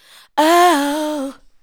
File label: ADLIBS 90; Ueberschall; Jam Box